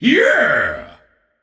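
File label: non verbal yay, voice; cernunnos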